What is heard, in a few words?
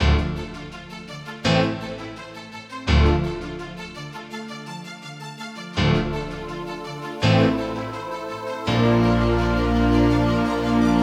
Forest Chase, fantasy